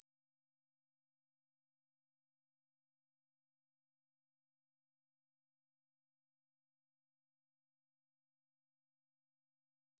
sine tone 12000hz

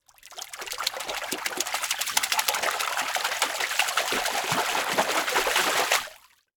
Liquid Water Water Hand Splashing In Water Fast

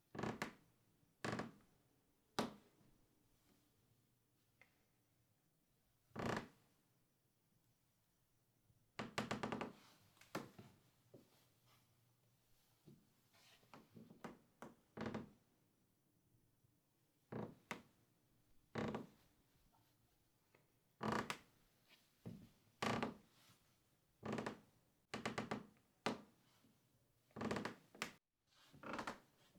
Wood Floor Creaks and Ground; Blackmagic Fairlight

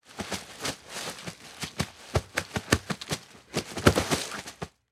Human Crawl Through Grass And Stumble

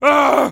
Human Male Yells And Groans Short

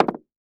Sports Board Skateboard Hits Cement

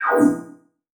Multimedia Internet CD-Rom Flash Pop Up

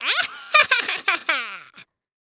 Horror Scary Witch Cackle

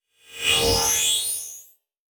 Multimedia Internet CD-Rom Flash Electronic

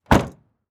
Truck Envoy Close Trunk; transportation

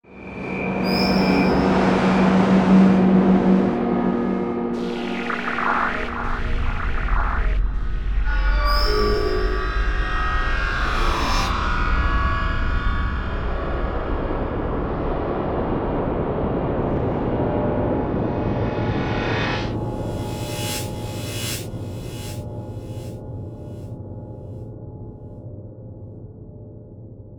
Electronic Whoosh Reverse Feedback Digital

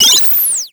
Title Transition Data Transmission Terminated; Production Element Title Transition

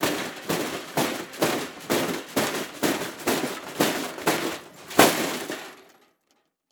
Crash Metal Rocking On Concrete; Crashes

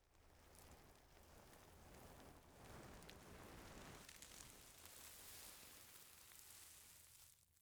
Horror Body Moving In Earth Mix

